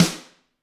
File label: GRETSCH SNR; ILIO; Double Platinum Drums 1